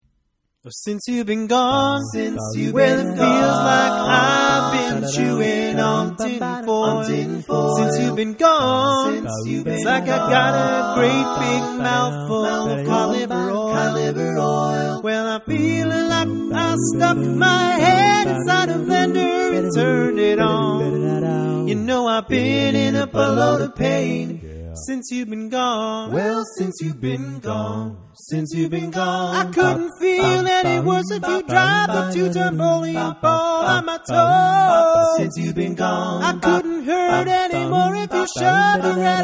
barbershop tags, all parts

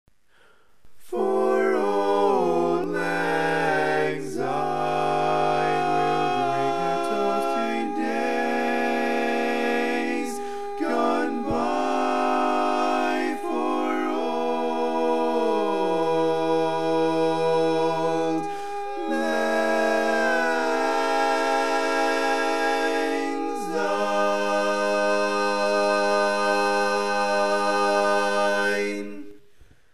Tenor, barbershop

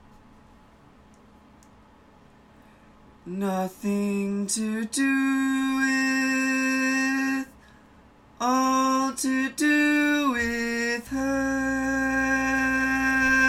Lead

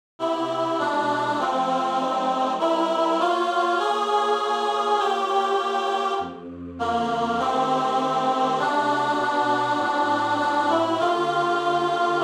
Lead, Barbershop

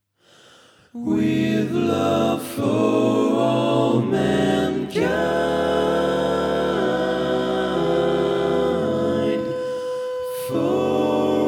Bari, barbershop

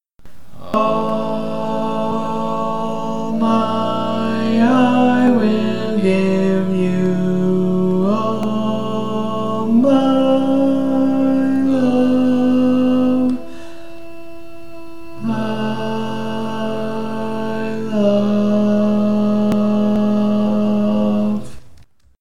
Bari, barbershop tags

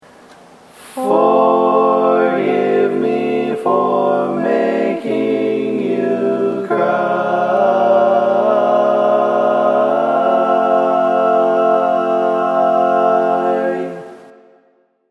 All Parts, barbershop tag

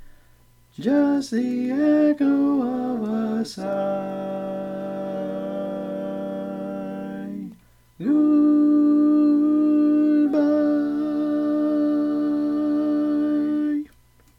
Echo of a Sigh Tenor, barbershop tag

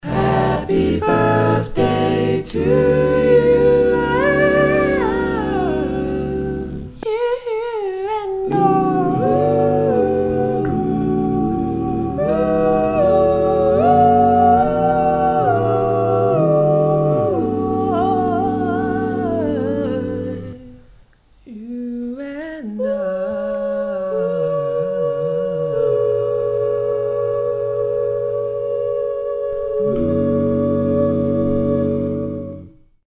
extreme reharm All Parts, barbershop tags

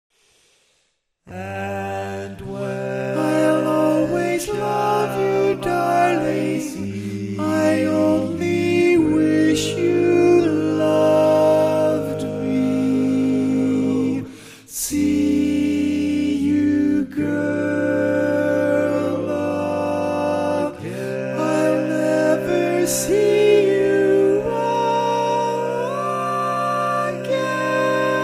Tenor, barbershop

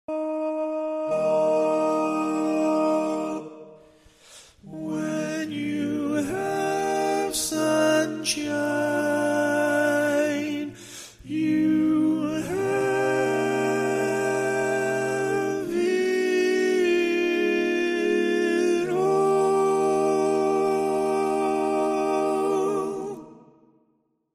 Tenor

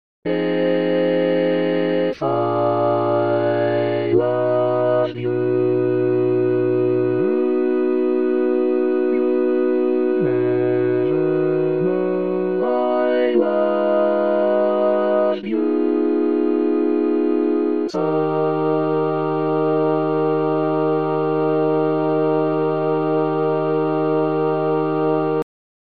Lead, barbershop tags

